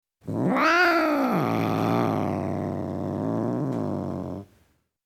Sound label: Tom Cat Growling, Individual Grow; Cats; BBC Sound Effects Library